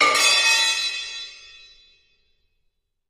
Impact Metal Crowba, crashes

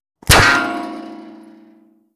Bullet with Whir Impa, metal

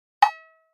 Pop Up High Hollow Tap

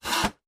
coping saw stroke

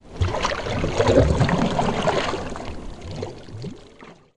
Canoe Paddle Stroke Underwater

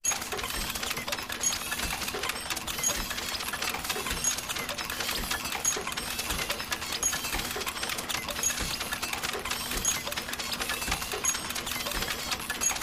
Frantic Robot Aggres, servos

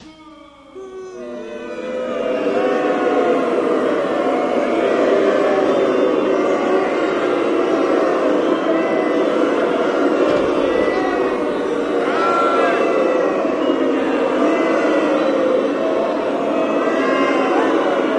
Crowd Boos, Interior Gym, Bad Guy Appears

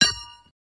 Horseshoe Hitting

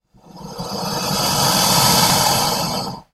Alligator Sigh

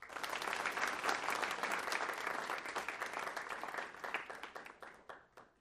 Crowd Short Polit, small int

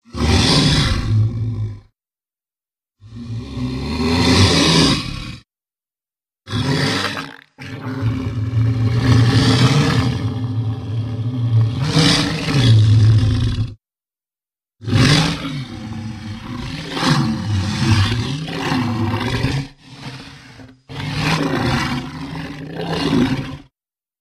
Monster Growls

